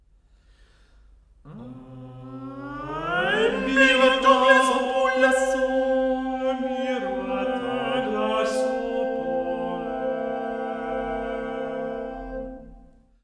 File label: fig8 vocal ensemble; NCEM; original